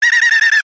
cartoon dolphin laugh; zapsplat

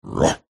Evil Ruff!

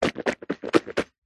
human male swishing water around in closed mouth

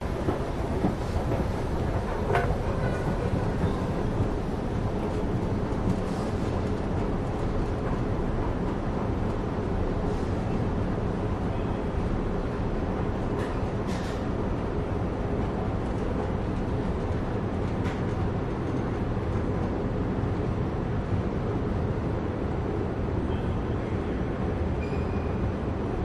Metrostation Escalatorsound; Budapest Hungary; your free sounds

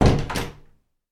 household door wooden internal shut

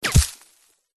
warfare bullet hit ground dirt; zapsplat

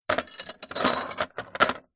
handcuffs metal heavy set down on wood surface

